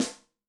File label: Snare, Yamaha DTXpress-II